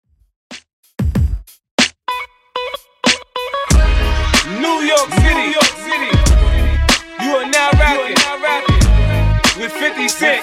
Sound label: Hiphop Redrum 94 bpm